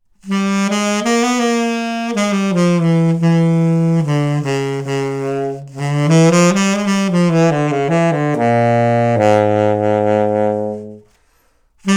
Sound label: Metal Link 8 Diminished; JVW; STM